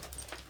Step

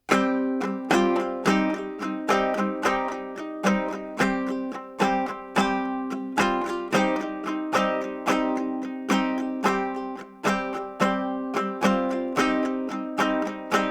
Tempo 110.0 bard kitara; assassin s creed valhalla

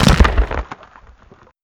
Twist

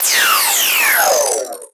Fx Downer Emulator

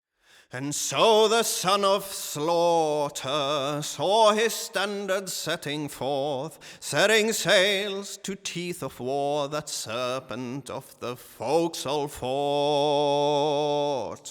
Tempo 80.0 eng vocal; assassin s creed valhalla

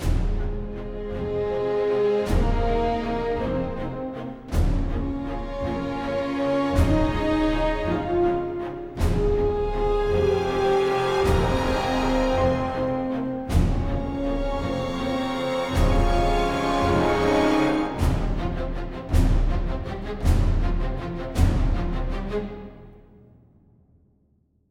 Combat Threat 160 F m 3 4; Combat Area B1 Threat4 160 Fm 34 1

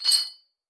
Chainmove

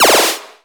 Fx Laser Gadget

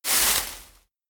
Leaf

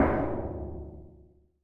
Barrel Impact

